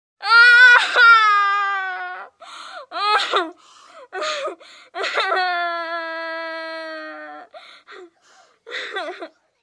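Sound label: crying of a girl about 8 years old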